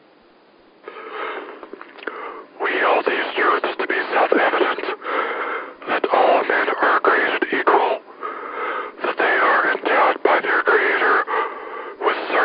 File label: whisper FM